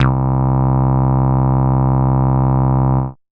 Moog Bass, Dance Synths

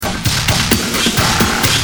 Sick kit, Drums Perc; Industrial

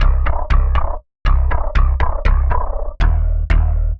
Klass Bass, Bass Foundations; Sonic Foundry (Sony Creative Software); The Electronic Music Manuscript Collection

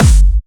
Distortion Kicks, Bassdrums

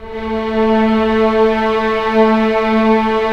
Orch

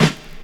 SD, dance drums hip

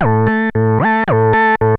SYNTH -R, Roots Disco; USB Soundscan vol.32; AKAI

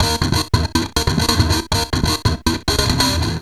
Treat Guit, Industrial Nasty

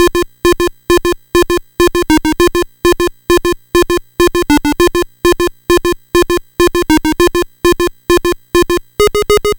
NES Synth, chiptune; Sonic Foundry; Nintendo Entertainment System

